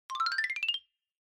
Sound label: Xylophone Slow Long Up